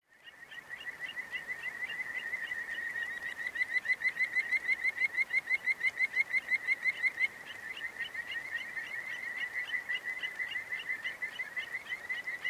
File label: kajika chidori